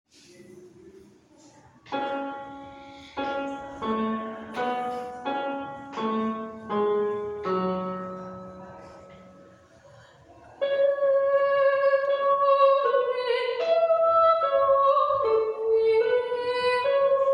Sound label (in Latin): credo soprano missa festiva